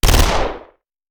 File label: Machine Gun; Sound Ideas; Guns